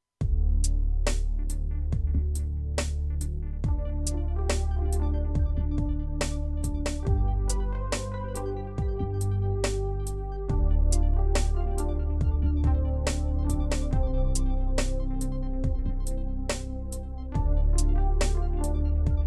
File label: Corporate Ladder